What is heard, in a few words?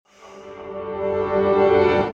welcome piano; Intro; osu